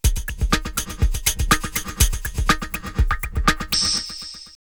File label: Warm Wet 122, drumloops, DrumMachines; X-Static Goldmine